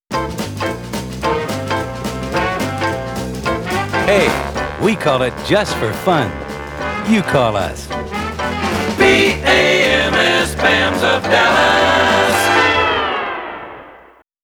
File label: Turn your mind off, baby, feel the fun vibrations of (Sig), it's fun